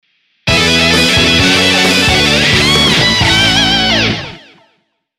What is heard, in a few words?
bgm stage clear; Flying Girl Striker